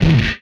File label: Explosion, retro arcade; Explosions; MMRetroArcadeSoundsPack